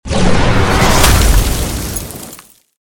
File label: attack; hero sound